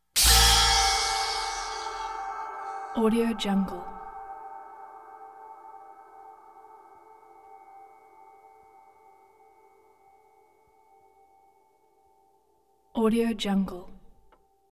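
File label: Chains, chain & prison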